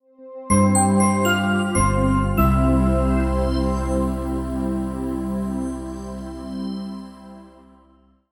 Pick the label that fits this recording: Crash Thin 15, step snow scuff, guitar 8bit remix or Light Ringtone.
Light Ringtone